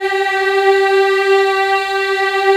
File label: G3 women eh, Choir Acoustic & Synth; USB Soundscan vol.28; AKAI